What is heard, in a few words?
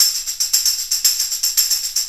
Tambourine